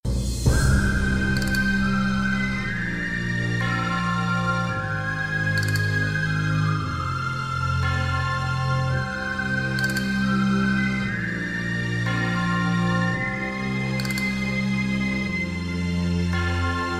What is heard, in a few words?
Wild West Intro